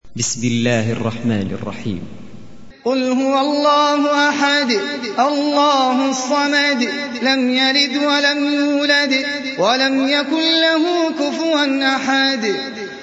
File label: Al Ikhlas retsitatsioon